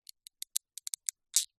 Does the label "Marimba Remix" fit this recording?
No